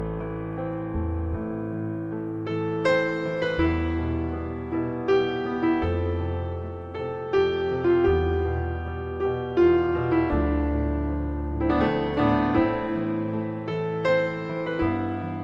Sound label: piano ringtone